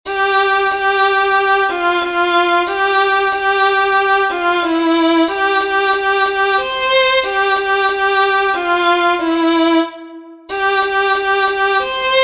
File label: hang